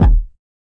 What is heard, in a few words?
floppy bounce kick; Kicks; single hits